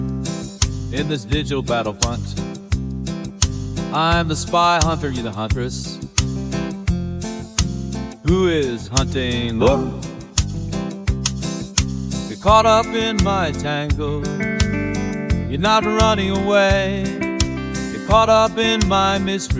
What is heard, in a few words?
folk